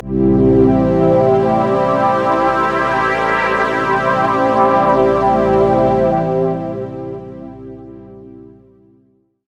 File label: chorus; buzz venus